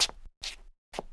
doslap; Mafie 1

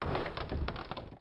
vrz; Mafie 1

